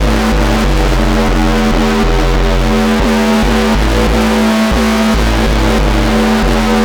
TECHNO EXTRA 140

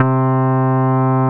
BASS MINIMOO

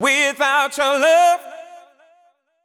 deep house male, 125, A, vocals

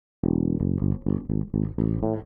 DEEPHOUSE BASS 125 A

